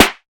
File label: Trebly Snare Single Hit G Key, snare single hit tuned to the G note; snare drum samples